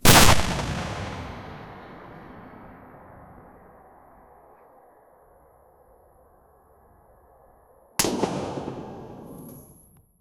the sound of a cannon

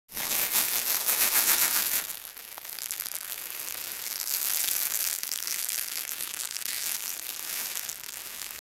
packing product, wrap

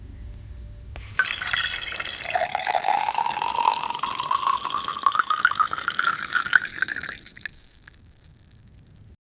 the sound of tea pouring, asmr

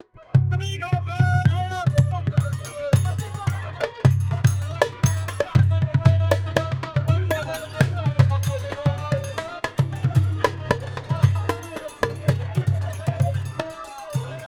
duduk and arabic drums

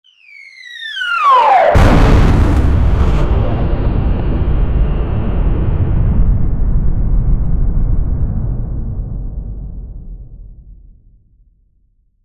the sound of stars explod, cosmic

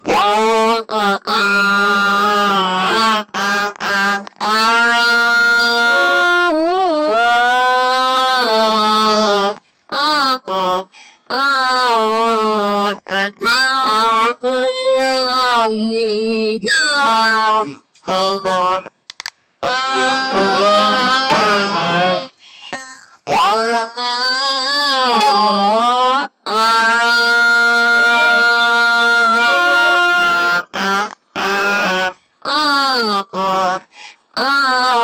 15 year old african, funeral

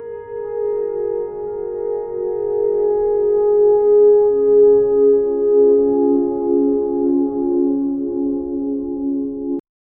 very soft emotional piano

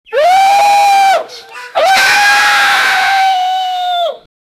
panda shouting with pain

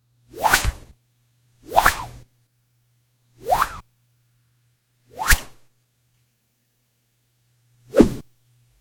sound effects for a hand; gesture

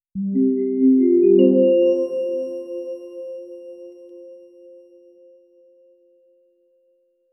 smooth rewarding confirma, chime

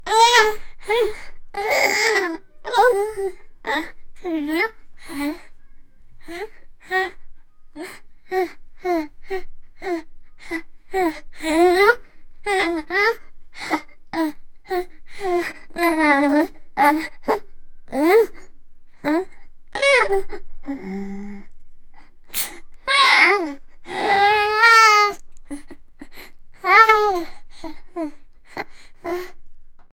13 year old girl, effort

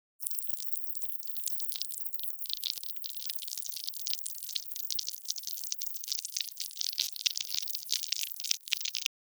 venom symbiote sticky par; biology

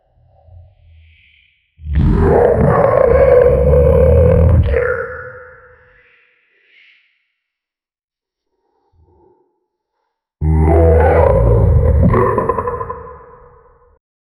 t rex dinosaur; dinosaurs

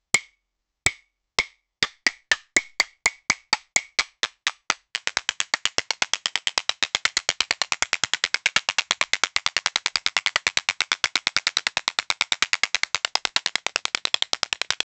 a single clear plastic, plastic

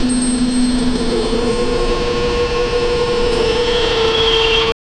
mechanical hisses; chilling

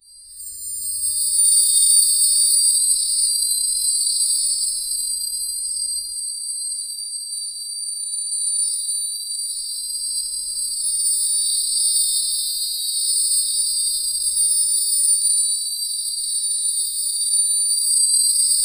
wind soft uneasy ambience, chimes

